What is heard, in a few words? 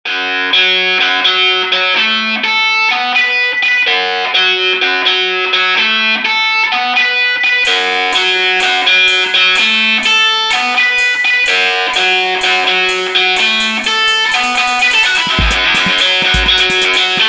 sweet guitar